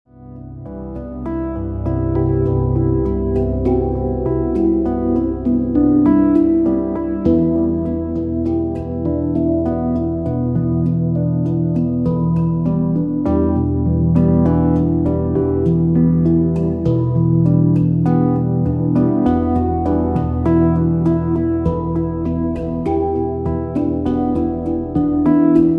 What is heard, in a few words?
Deep Relaxing Music